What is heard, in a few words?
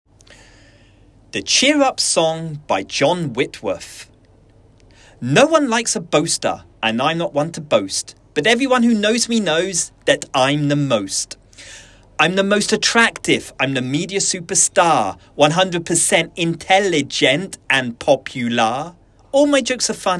Girls Solo Verse